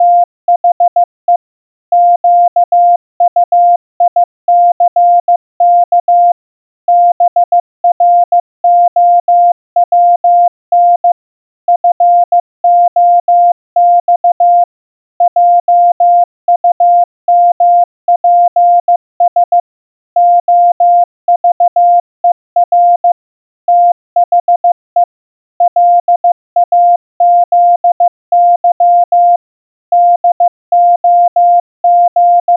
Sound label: morse practice 15wpm